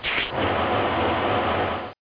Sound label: Ditch; F/A-18 Hornet 2.0 Demo